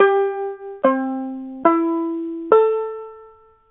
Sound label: BANJO UKULELE GCEA